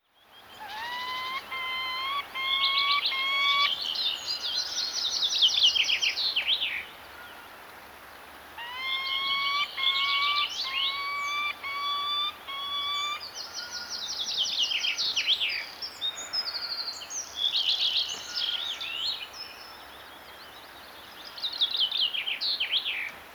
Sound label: Peregrine